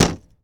Plane P40 Hit Bang Canopy; SFX Kit; Airplanes

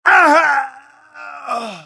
male pain death; firefight

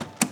65 Corvette Door Open, Automobiles

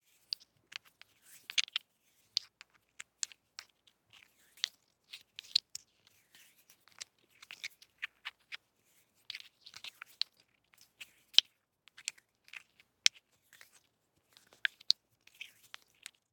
DICE in Hands, Manipulate, Two Dice; Samples; ArticulatedSounds; DICE Sfx Party (Extended)

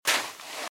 Footsteps Sand Dry Walk Right; RadwinIsland

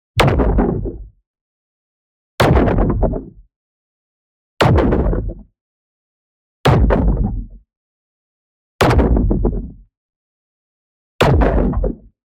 EXPLOSION LARGE Distorted Tonality; boom library; Matter

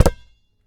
Street Ball Rebound Grab; SFX Kit

